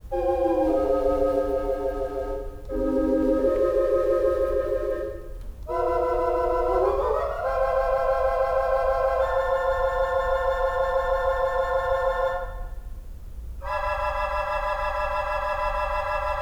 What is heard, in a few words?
solo tibia